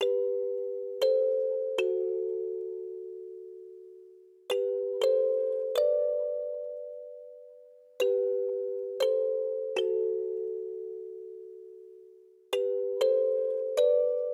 Music Box

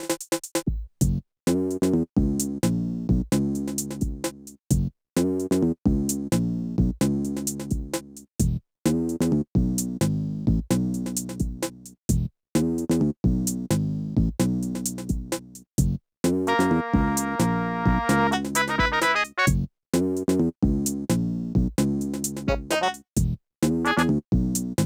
Funk (Aircheck, Retro)

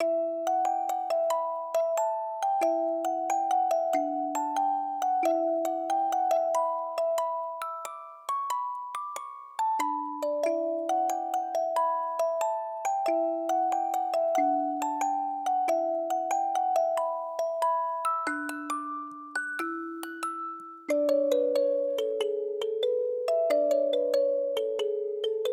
Music Box

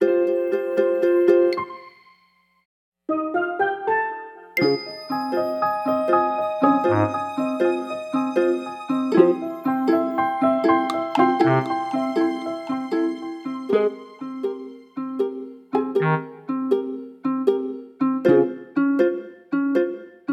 Main Street 2 – Night

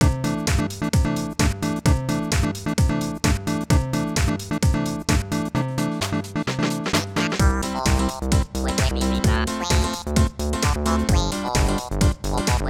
House Remix

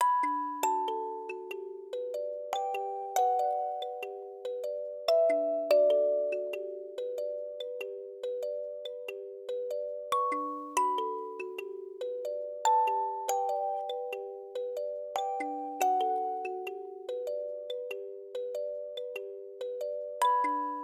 Music Box

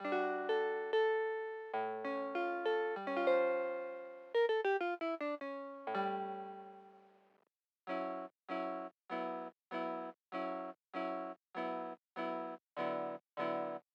Weather TV Show, Rainy

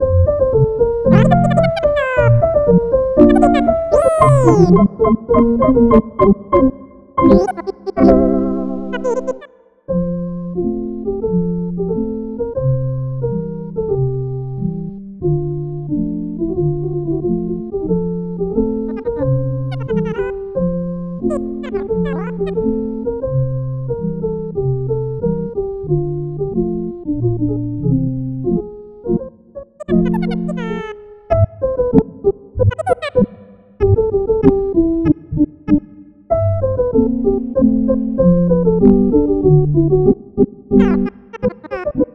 Exercise, Morning Aerobics; TV Program

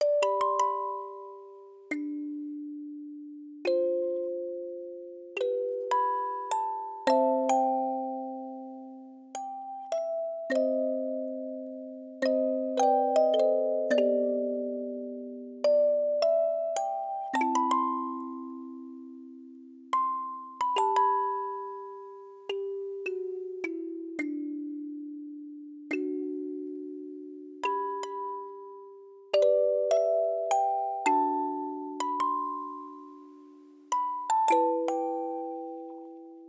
Soulful (Music Box)

